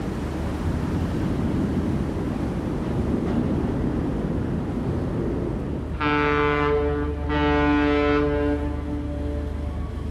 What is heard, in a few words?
ambiance strait bosphorus boat traffic loop